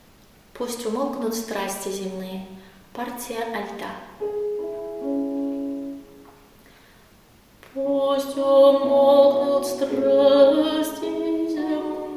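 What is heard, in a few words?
alto, pust umolknut; Pust umolknut strasti zemnye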